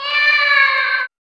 CAT-FX; E-Lab; X Static Goldmine 1

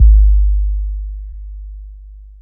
SYNT BD; E-Lab; X Static Goldmine 2; akai

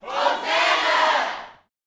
"hosana", gospel shouts; Spectrasonics; Vocal Planet Vol.1 Gospel; akai; UYC SHOUTS

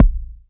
PERC; ZERO-G; Datafile

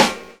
SD FUNK, SNARES; E-Lab; X Static Goldmine 2; akai

